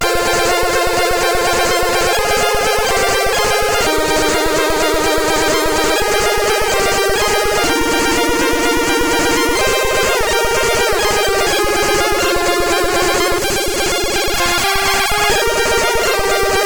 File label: High Trance, CSG8580R5